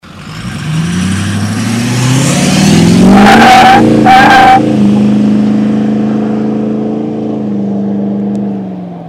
ford takeoff

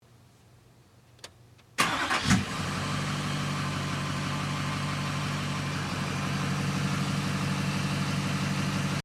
lmm chev start

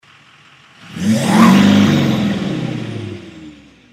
dmax rev

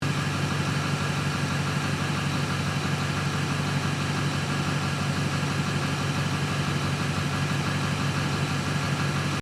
lmm chev idle

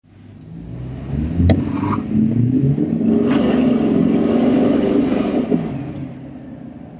67 ford inside

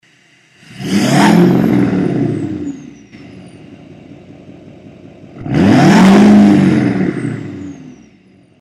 lml straight rev

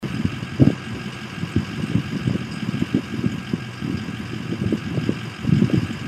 ford idle

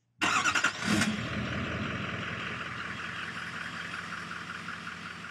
dmax start

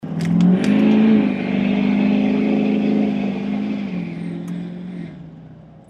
lml straight inside

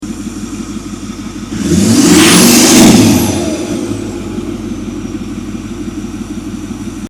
64 ford rev

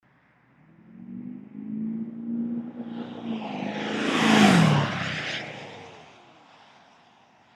dmax flyby

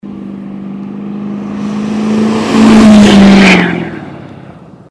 lmm chev passby

